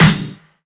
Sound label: wet snare g key